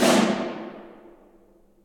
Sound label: Snare